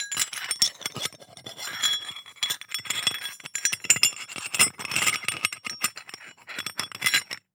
Hole Saw Rattle, Hole Saw Bits

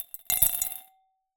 Drop In Glass, Medium Wine Glass

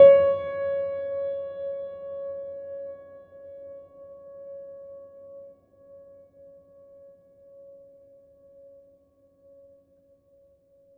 Note Ped, Piano; Piano Miscellaneous

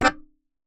Melodica Short Note

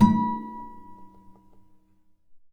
Classical Guitar Extended Technique Plink

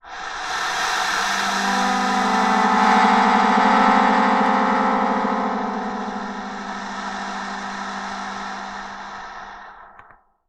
Wind, Melodica

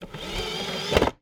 Insert, DVD Drive Internal; DVD Drives

